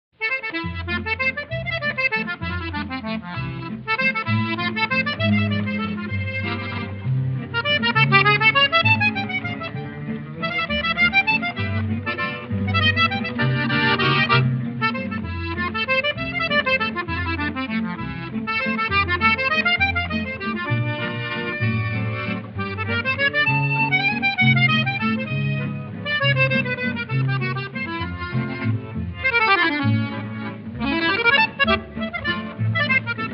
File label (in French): Swing Valse